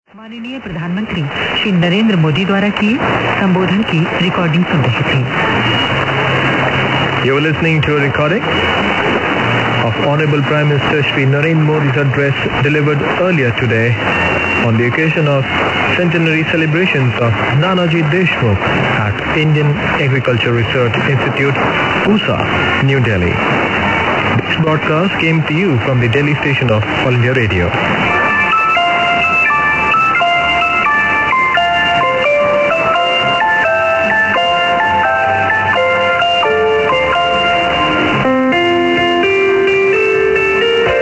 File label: 918 All India Radio IND; sdr Amberg